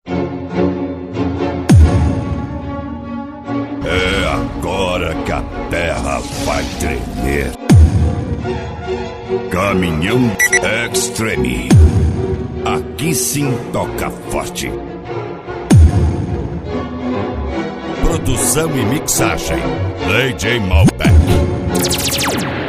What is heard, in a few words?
especial funk bass; caminhao; caminhao extreme